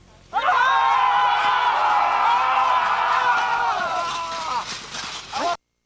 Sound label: charge